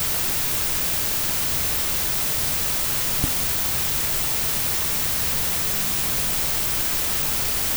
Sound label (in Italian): Tocco su microfono